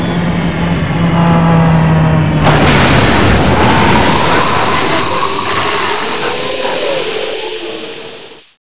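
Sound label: shipwreck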